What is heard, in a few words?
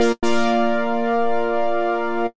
Win Sound